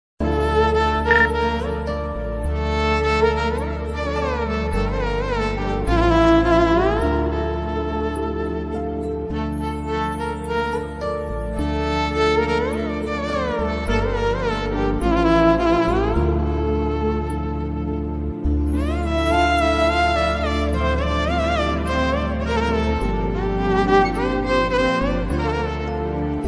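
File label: violin ringtone, love bgm, hindi song bgm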